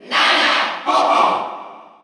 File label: Cheer Russian; Ice Climbers; SSBU